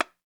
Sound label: TAMBORIM, Latin Drums; Latin Drums & Rain